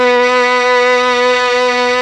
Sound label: RED STRING; ILIO; Propeller Island Legendary M400